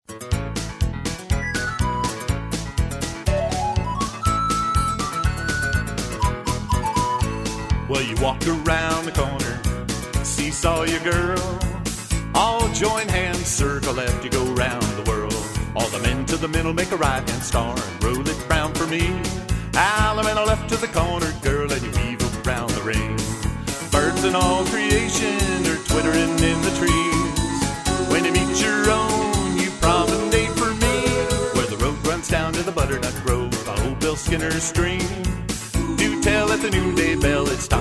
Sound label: vocal